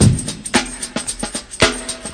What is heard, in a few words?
classic brk; VIBRIS CLASSIC BREAKS